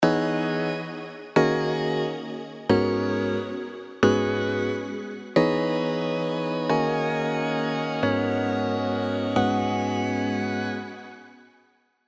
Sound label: KS What Is Jazz, SERUM KEYS